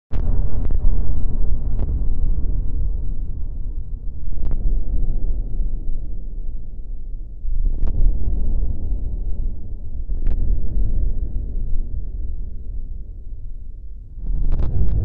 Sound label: Atmos Eerie Drone; atmospheres